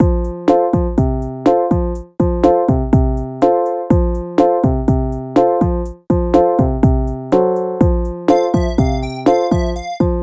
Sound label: Coin Op